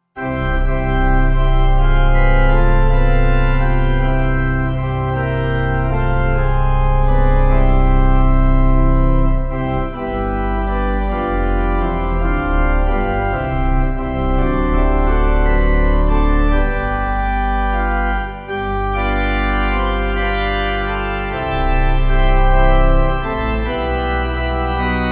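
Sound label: Pipe, Pipe Organ; How Happy; Grace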